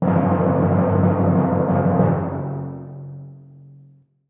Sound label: timpani roll f#1, percussion; Sonatina Symphonic Orchestra